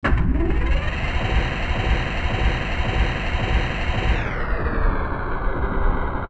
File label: Elevator; Forest Temple